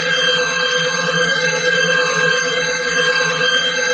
stair laser loop; odin

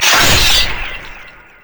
crit attack; LoL; yasuo base

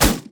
vs flesh; LoL; jax

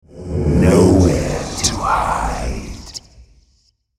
ultimate effort; Thresh

